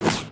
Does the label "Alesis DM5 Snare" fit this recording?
No